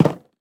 樱花木 栅栏门 开关声

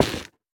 菌柄破坏声

